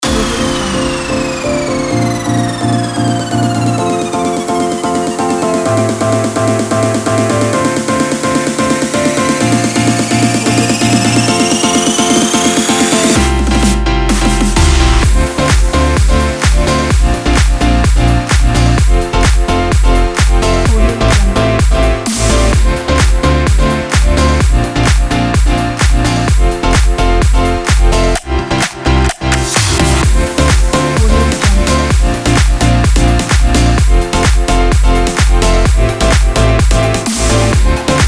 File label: dance pack; blue eagle audio